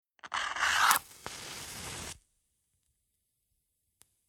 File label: Fire Match Strike Slow Single Match Flame; Flames